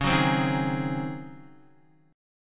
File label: Db Major 7th Suspended 2nd Suspended 4th Db Guitar; x,4,4,5,3,3; DbM7sus2sus4 strummed; JGuitar